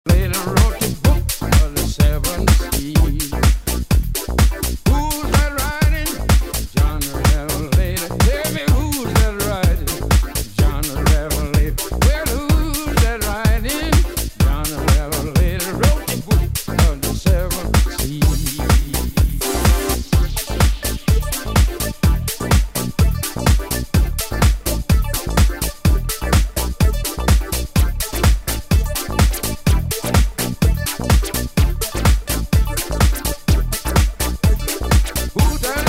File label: House Blues